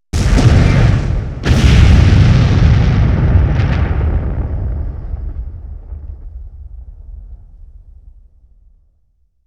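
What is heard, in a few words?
5.1 explosion, centre channel; Sony Pictures Sound Effects Series; Explosions - 5.1 Surround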